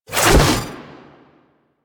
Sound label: Ability Throw; Warframe; Warframes; Trapper